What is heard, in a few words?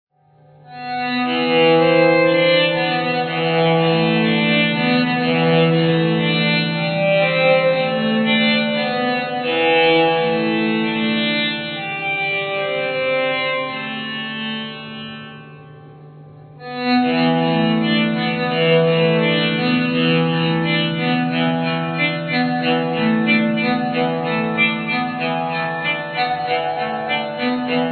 symphonic house